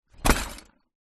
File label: broken glass in cardboard box drop down on ground; foley